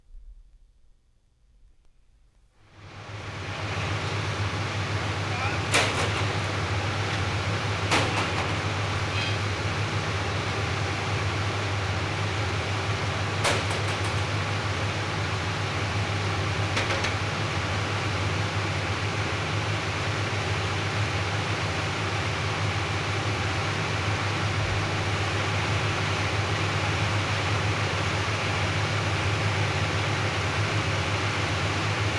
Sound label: News Presses Moderate Speed; Jac Holzman; Authentic Sound Effects Volume 2